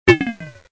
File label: space bounce